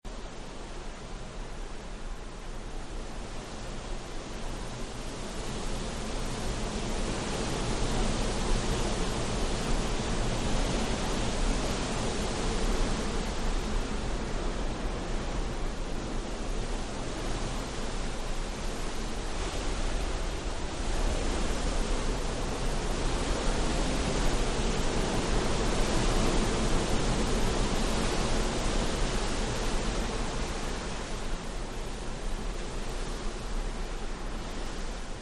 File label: Wind Gale Force; Weather